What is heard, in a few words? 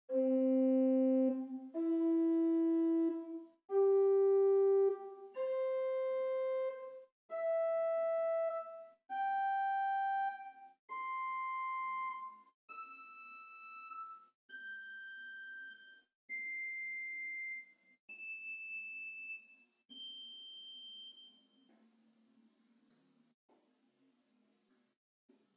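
Swell Principal 2 arpeggio, organ; First Baptist Church, Riverside, California, USA; Schantz, 1966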